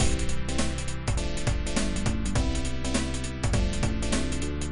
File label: theme loop easy breaks